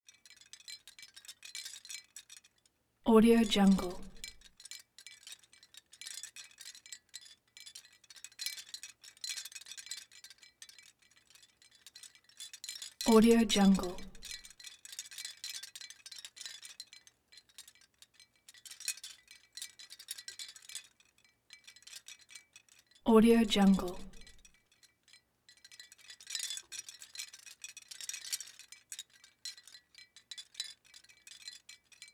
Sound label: shell wind chimes scallop shell type start